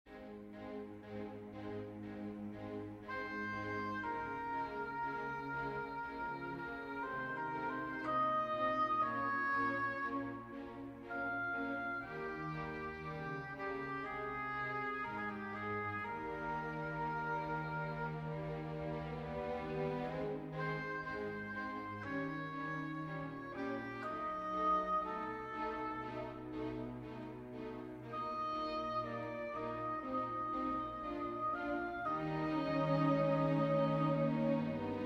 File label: for oboe strings; Schumann, Robert; bist wie eine blume